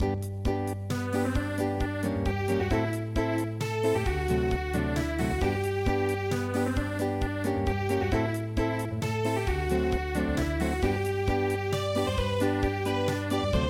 Acoustic, traditional; midi

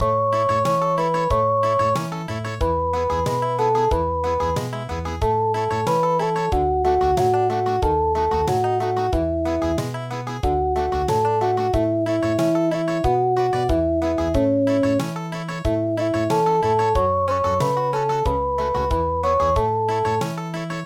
spring country

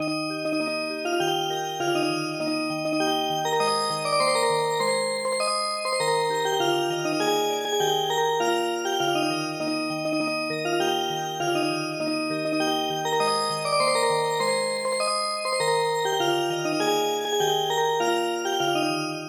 Music Box

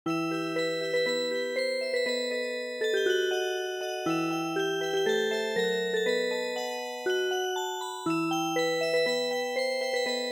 Music Box

